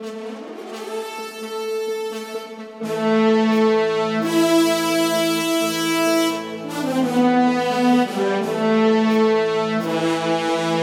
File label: Music Berserk Brass; 7 Mages